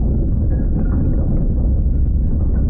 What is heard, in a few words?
ball; waterfall